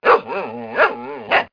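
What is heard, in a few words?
dog bark; Microsoft Graphics Studio Greetings; Microsoft 1998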